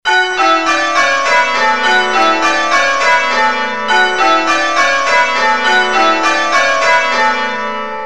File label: Rounds, changeringing